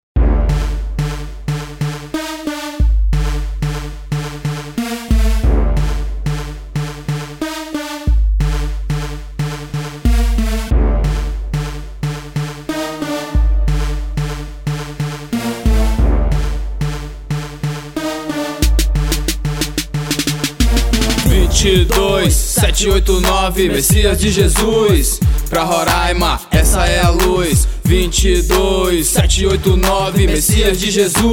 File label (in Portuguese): forro rap